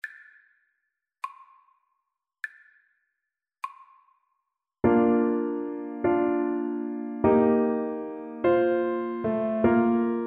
horn, French Horn

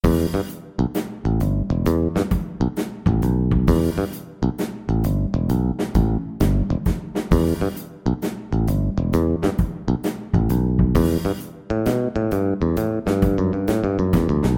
bass guitar

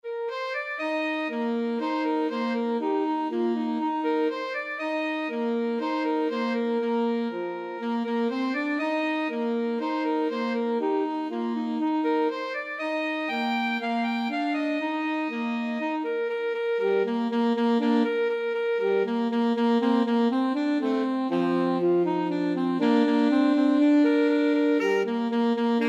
alto saxophone

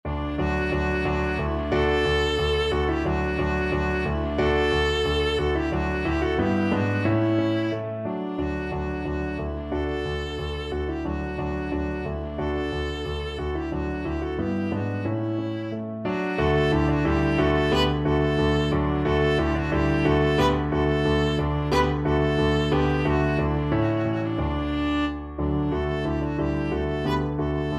viola

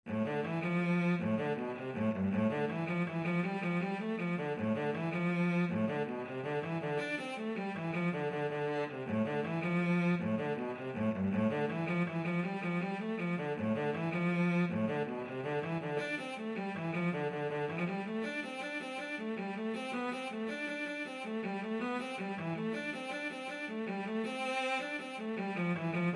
cello

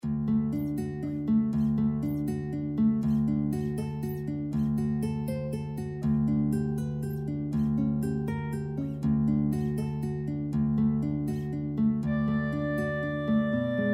guitar clarinet duet; schubert ave

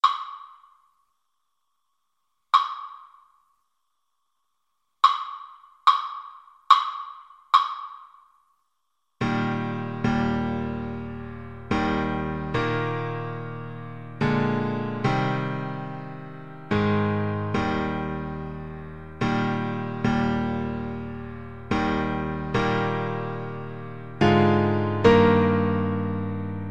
amazing grace, Saxophone

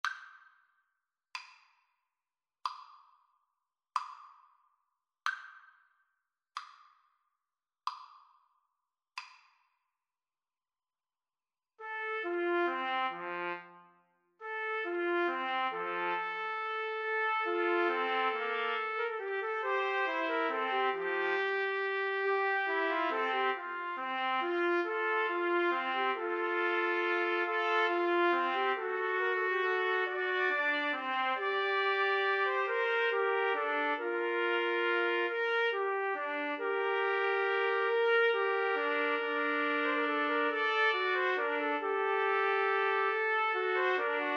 3TPT, Trumpet Trio

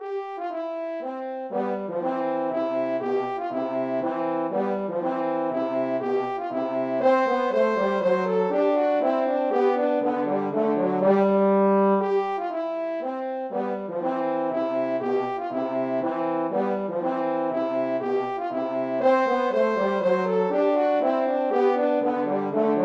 minuet, french horn duet

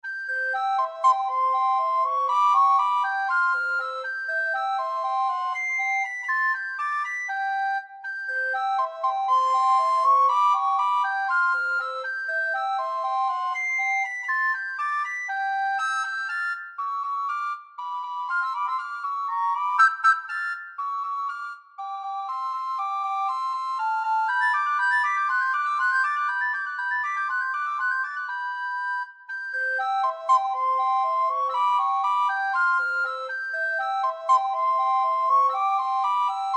recorder duet

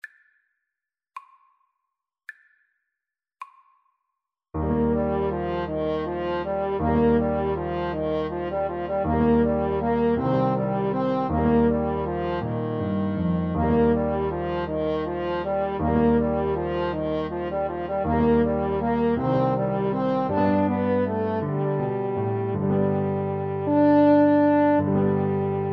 grieg morning 2HN, French Horn Duet

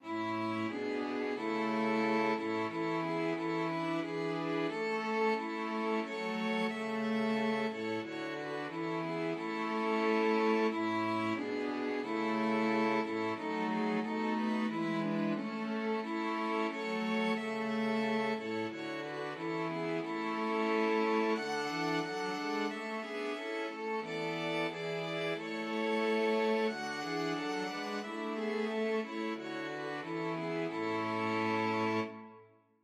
string quartet

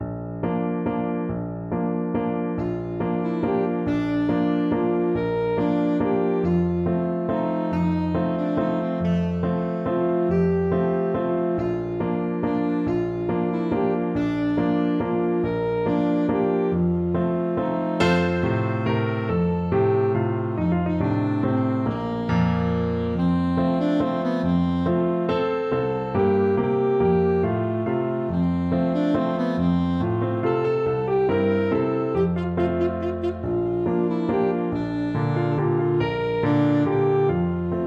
saxophone

